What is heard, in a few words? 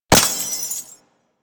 Glass Shotgun Projectile Hit; Warframe; Tenno; Weapons